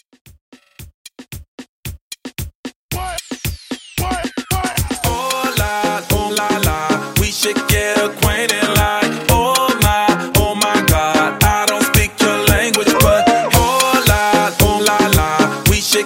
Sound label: HipHop Reggaeton 113 Bpm